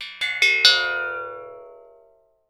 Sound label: OHM PLATE; Voice Crystal - Steve Reid Definitive Percussion; ERIE FILM-Q